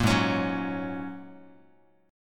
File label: G sharp 7th Suspended 2nd Suspended 4th down Guitar; G#7sus2sus4 strummed; 1,4,4,4,x,x; JGuitar